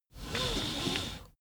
car seatbelt release

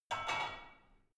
barbell only replace soft; sport freizeit; fitnessstudio

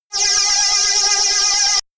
shot annoying buzzing tooth, zaps buzzes

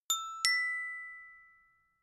notification glockenspiel fifth up

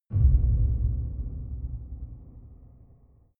far outer space shadow, hits impacts

